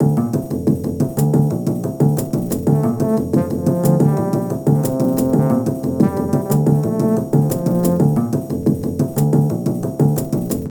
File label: Carillon